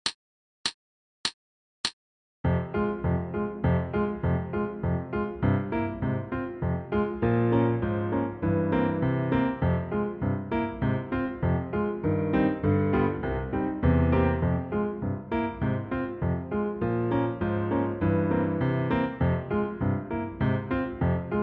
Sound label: Accomp Only, Baritone; Solo Accompaniments